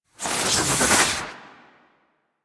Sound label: Melee Heavy Attack Small Charge, Weapons Melee Whooshes; Warframe